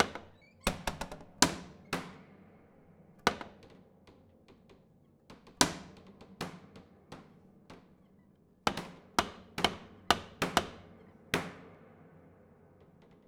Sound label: Panels Slap Dense Ped, Piano; Piano Destruction